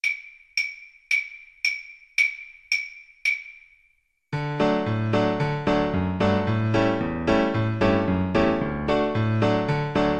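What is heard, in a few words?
karaoke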